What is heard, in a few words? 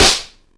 damage; buckler